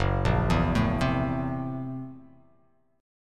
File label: Gb 7th Sharp 9th Flat 5th Gb; arpeggiated; Gb7#9b5; 2,1,2,2,0,x,x,x; JGuitar